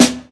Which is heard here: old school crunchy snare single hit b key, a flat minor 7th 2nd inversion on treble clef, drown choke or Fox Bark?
old school crunchy snare single hit b key